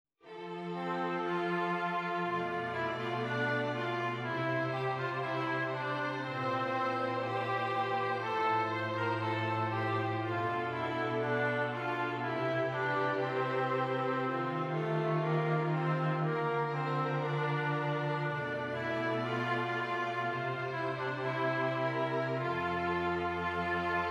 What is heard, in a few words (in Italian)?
esercizi di contrappunto semplice A quattro voci